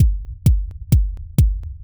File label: dark techno rumble kick